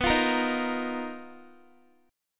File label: B Major 7th Flat 5th B Guitar; BM7b5; strummed; x,14,17,15,15,x; 4F,4A,5C,5G,5C,6E